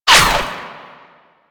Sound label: Ranged Weapon Whizzby; Warframe; Necramech